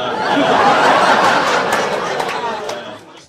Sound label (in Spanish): RISAS CORTAS